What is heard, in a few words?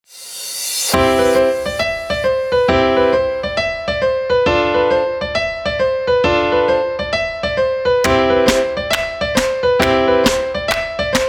Happy Piano Song; Mantra Studio